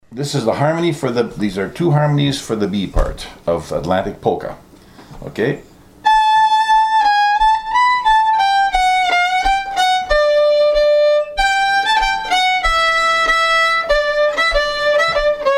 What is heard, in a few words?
harmony, violin